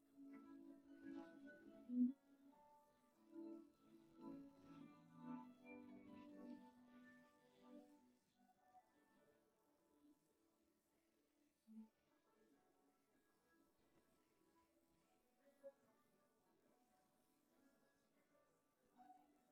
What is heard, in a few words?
guitar